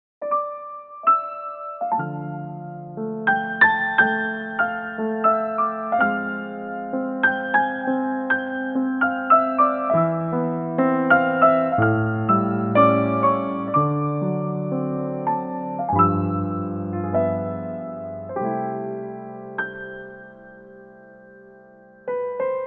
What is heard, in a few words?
C pian